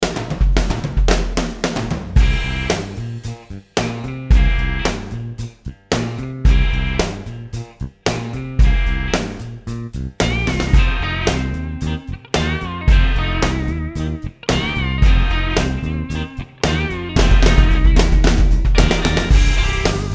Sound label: Backbeat 112; Meat